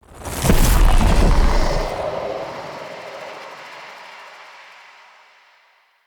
Corruption Grip Cast; Characters; Enemy; Lady Deora; Abilities

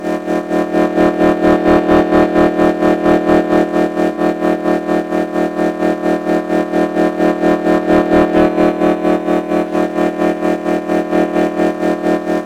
Guild Energy Shelf Loop; Warframe

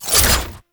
Whoosh, weapons; Gunsen Prime

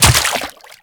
Step Water, Footsteps; Warframe; The New War; Characters; Kahl

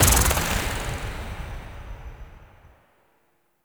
Fragment Found; Warframe; Cephalon

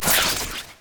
Scythe Spin, Weapons; Stalker Jade Scythe; Warframe

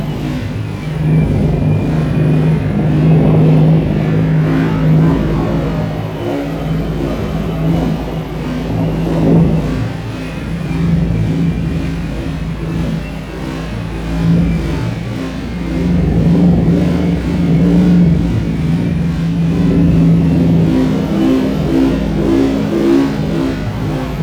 Crewship Engine Loop; Warframe; RailJack; Corpus Crew Ship

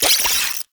Oraxia Brood Spider Vocal; Warframe

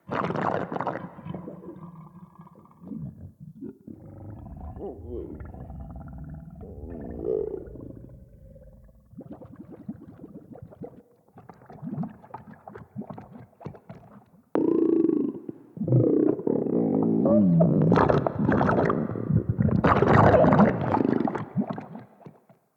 Stomach Grugs; Cinematics; Bromius; Bromius Quest Acorn Cleanse

